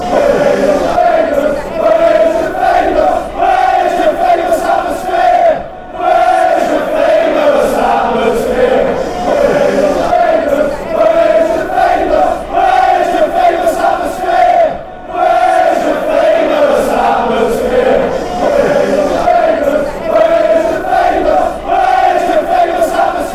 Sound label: wheres your famous atmosphere, fan chants